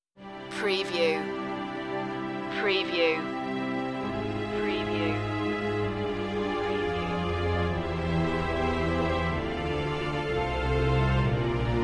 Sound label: a dream of strings